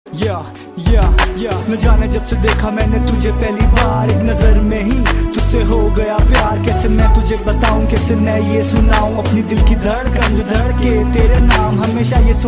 Indian Rap

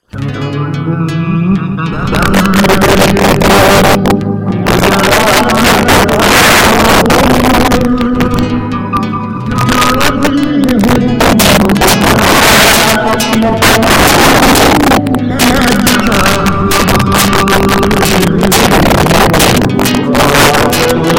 Remix

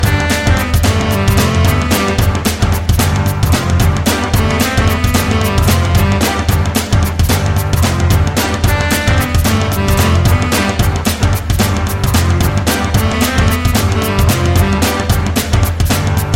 Deck The Halls

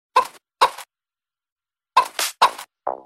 Hen Techno

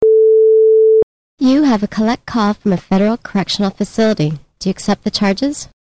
Collect Call

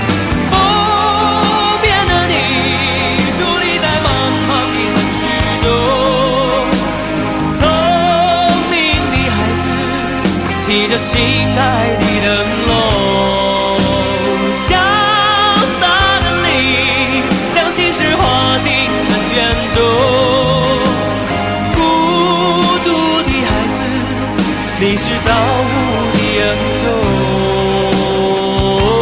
Chinese Song

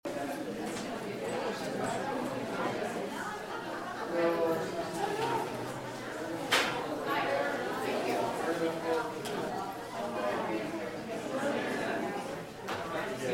Crowd Of People

